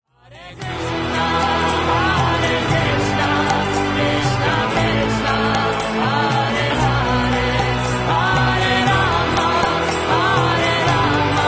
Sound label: Hare Krishna, Electro Punk